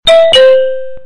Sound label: Ring the doorbell sound